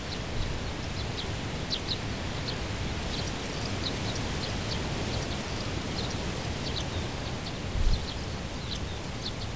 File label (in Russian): shum; cstrike; hutor